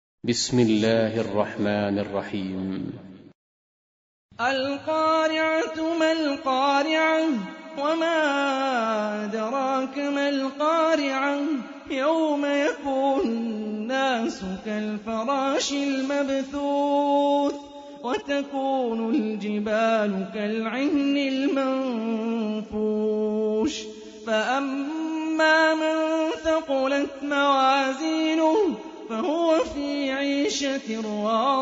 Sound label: Quran; Hani Refaey